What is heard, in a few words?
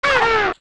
Die; Lizalfos; zelda; OOT